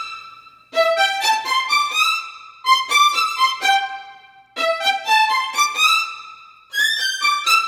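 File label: Funky strings, disco house; диско хаус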